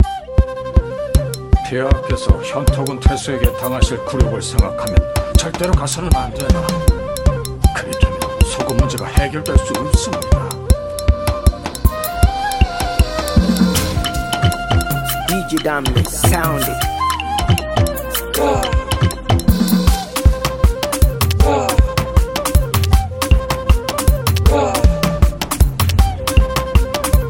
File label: Chinese Flute Beat; DJ Damlex Soundit